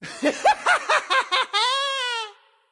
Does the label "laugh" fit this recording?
Yes